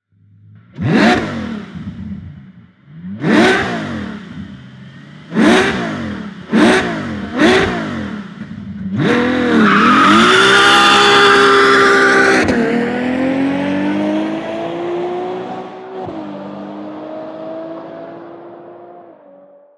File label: ferrari four exterior sound